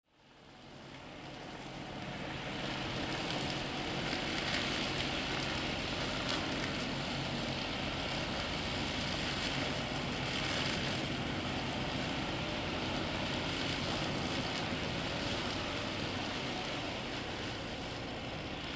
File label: Strimmers cutting grass verge various manoeuvres; two people strimming; Machinery; Machinery Sound Effects